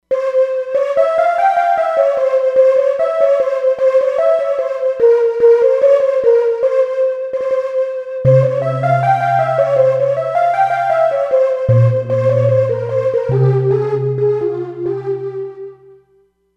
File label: Herbal Ney, E-mu Proteus-3; Factory patches